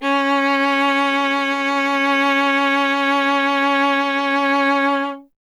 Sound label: viola C; Miroslav Vitous; Solo Instruments VOL-1